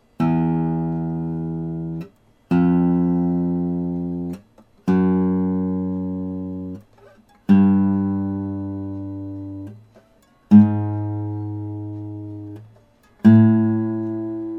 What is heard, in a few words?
yamaha after, guitar vibration